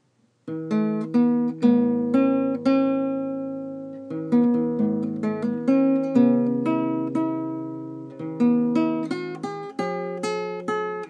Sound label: classical guitar